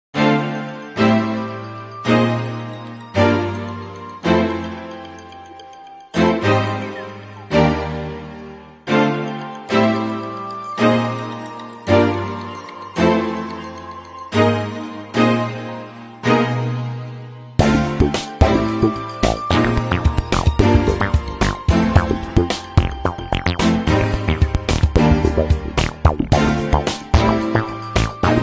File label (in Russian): originalnye ringtony i uvedomleniia nokia 808